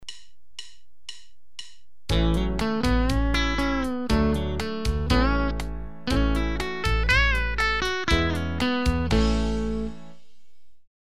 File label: Southern-rock lead lick in A, guitar